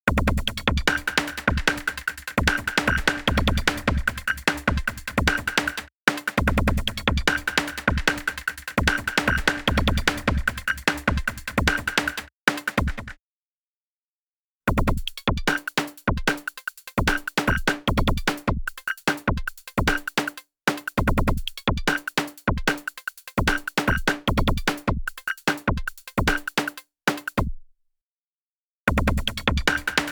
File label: Drum Thunderclap Drum Beat; Drums; UltraChannel; Preset: Thunderclap Dum Beat